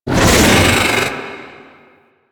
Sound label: Attack Vocals; Ambience; Golden Maw; Warframe; The War Within